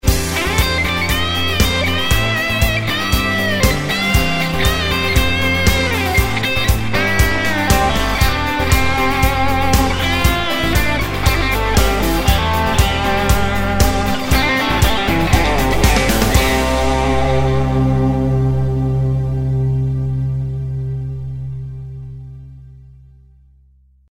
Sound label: outro solo, guitar